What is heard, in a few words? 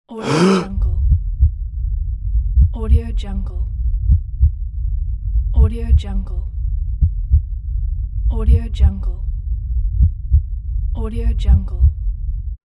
deep breath with heartbeat